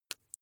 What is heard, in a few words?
flesh wet greasy squish single